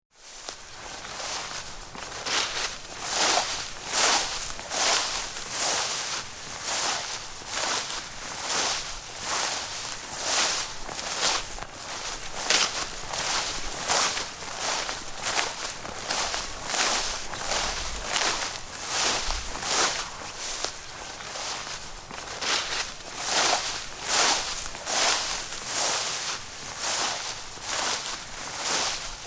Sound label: Walking Through Grass, Footsteps walking through long grass; Human